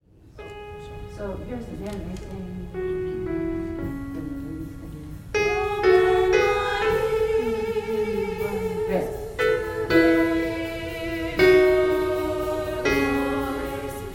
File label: ladies; Gather Hymnal